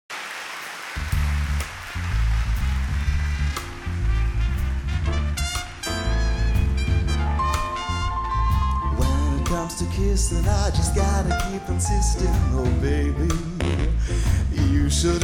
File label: LIVE Piano Music